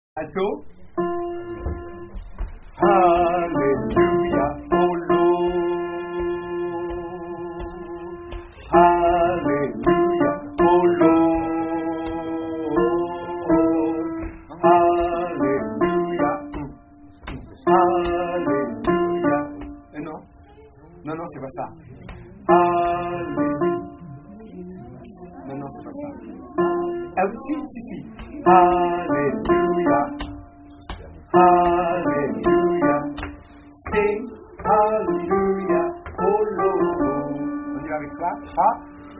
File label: alto